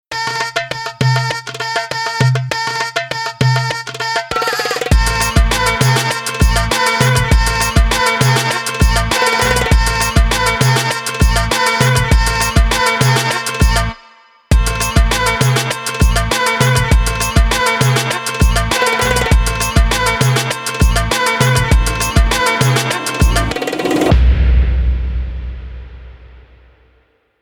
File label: JINGLE; MAROC WEB AWARDS